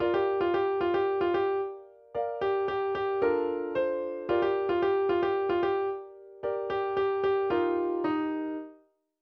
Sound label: instrumental